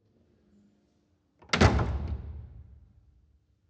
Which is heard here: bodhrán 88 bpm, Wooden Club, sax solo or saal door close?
saal door close